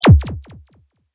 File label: Laser: Release From Firing; Laser Sound Effects; Lasers (Sound Effects:Science Fiction); Laser loop tail; Stuart Duffield